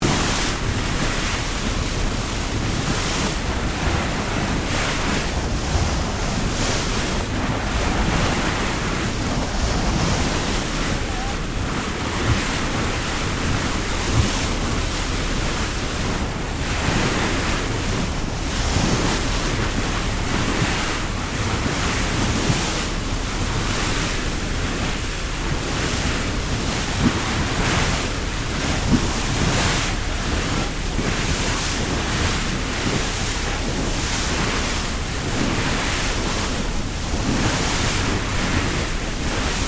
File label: Boat Ferry Freshwater Class Sydney Bow Wake Stereo; Manly Ferry; Boats; Vehicles; SoundEffects-Foundation1